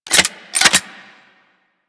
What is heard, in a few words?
Heat Gun Reload; Grineer